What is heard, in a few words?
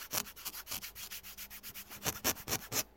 scratch; sports